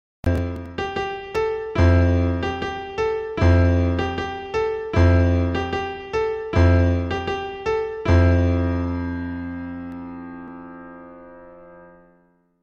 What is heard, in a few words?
tadan ten teen